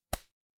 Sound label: paper fabric cloth whip